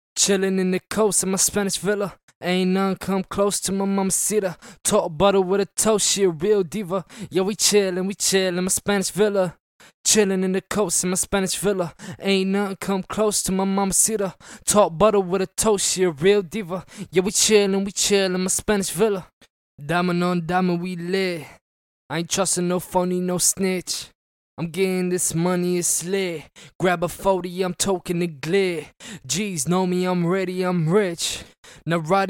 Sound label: hip hop 104 Fsharp